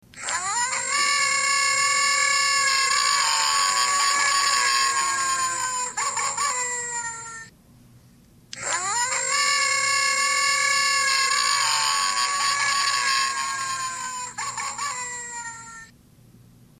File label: howl